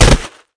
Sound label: bodyfall